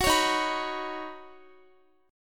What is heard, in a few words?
D sharp Minor 7th Sharp 5th D sharp, down, Guitar; D#m7#5; strummed; 4E,4A,5D,5G,5C,6F; chord shape